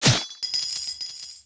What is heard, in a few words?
Ice